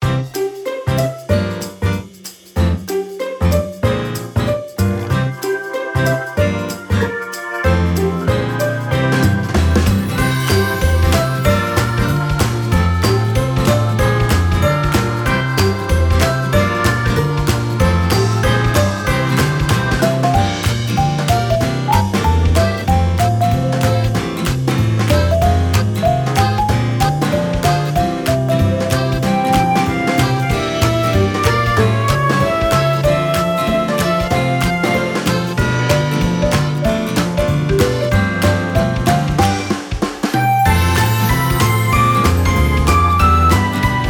ring

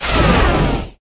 big bang; LiveOrDie

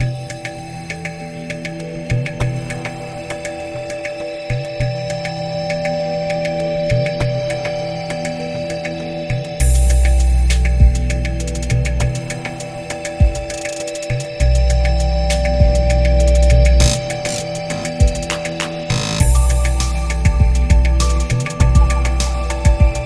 temple

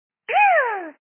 biu

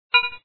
cursor

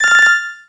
correct

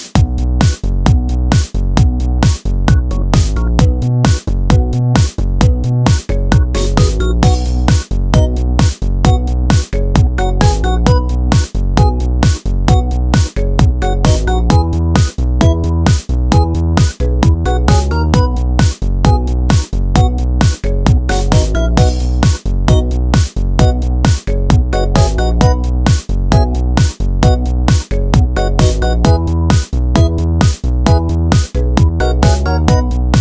bgm

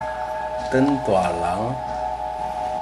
become Adult

